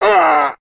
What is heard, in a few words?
zombie hit; STALKER; customnpcs